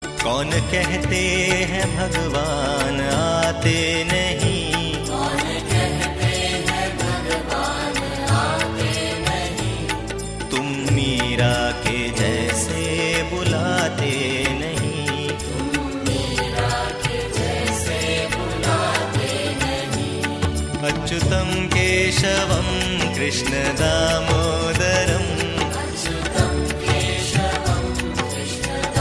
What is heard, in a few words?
Bhajan; Art of Living; Religious Mp3 Ringtones; Devotional Ringtones